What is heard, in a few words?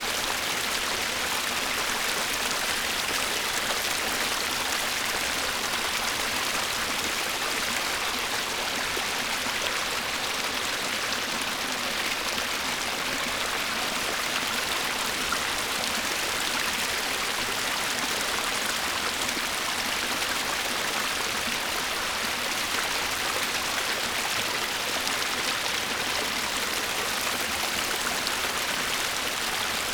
AMB WATERFLOW Stream Splash 2m Close; Systematic-Sound; Sounds Of Nature; Flowing Water 01